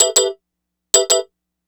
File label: Electric Piano, Loops, Keys